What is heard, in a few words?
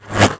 BlueFly Attack; Eft